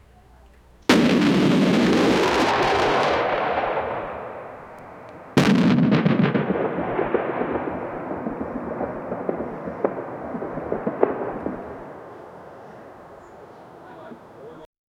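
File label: several fighters can be heard, bombing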